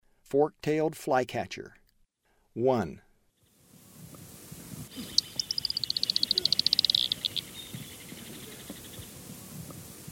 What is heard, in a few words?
Fork-tailed Flycatcher; S. America; Eastern Lowlands